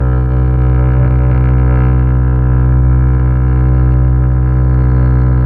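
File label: Mellotron Cello B2, Tron Cello; Keyboards of The 60's and 70's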